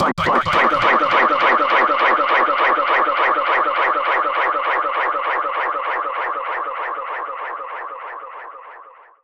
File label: SFX